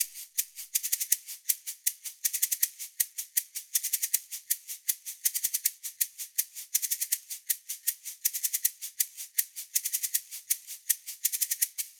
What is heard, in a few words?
latinshaker loop 80